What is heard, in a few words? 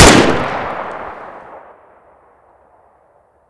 sound aug; ZP CRISTIAN; ZP WEAPONS; aug bio II